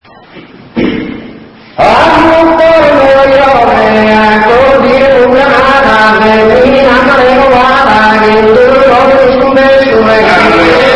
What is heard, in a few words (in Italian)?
tenores